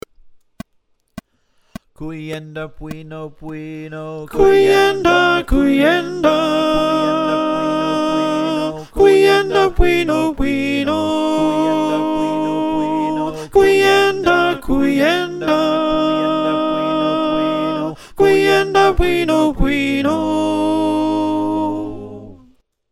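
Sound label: soprano, choir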